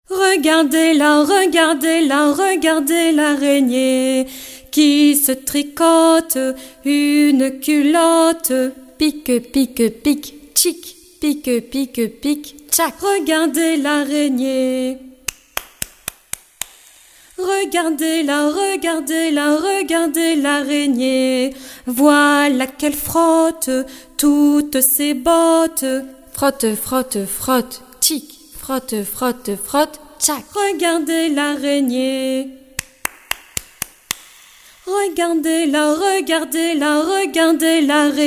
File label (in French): araignee chant